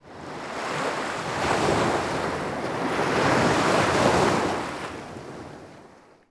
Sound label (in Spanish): olas